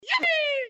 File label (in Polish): okrzyk short; Wild Jack